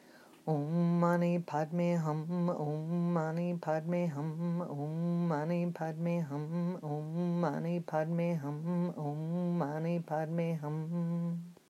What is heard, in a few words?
om mani padme hum